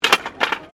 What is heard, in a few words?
SKATEBOARD SKATE BOWL DROP; Skateboard sounds; Skateboarding; Sports; Foundation4